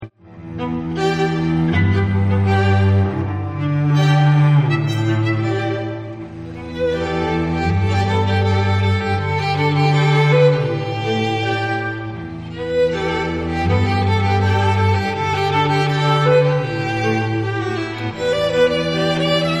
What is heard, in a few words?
Strings